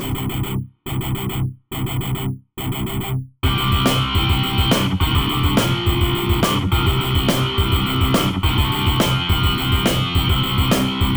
big boss

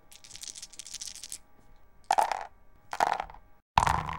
dice

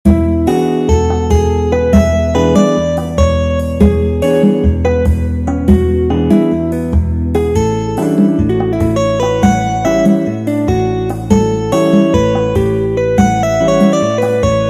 Mixolydian Heaven

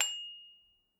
ding soft, dings

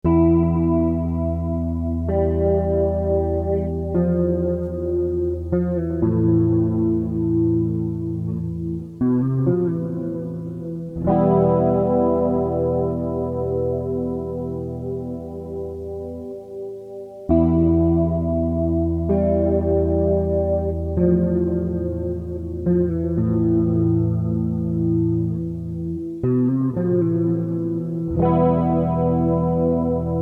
riff

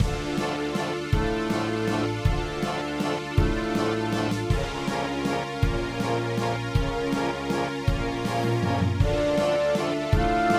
waltz of the ghosts remake

